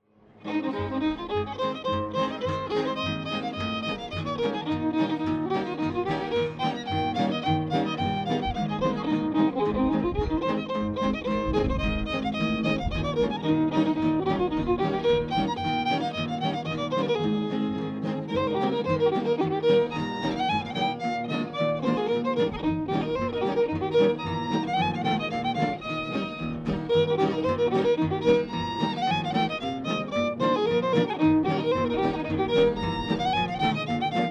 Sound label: clarinet polka